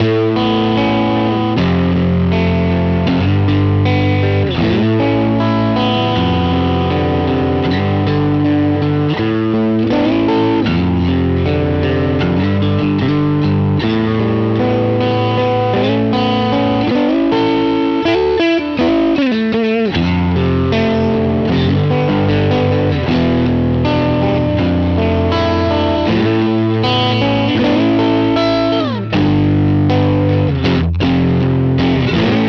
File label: amp squeezer guitar; Frequency-shaped overdrive.